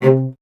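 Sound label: strings cello C; Miroslav Vitous; Solo Instruments VOL-1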